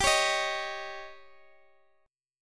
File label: Eb 7th